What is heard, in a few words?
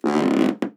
Hollow Rubber Creak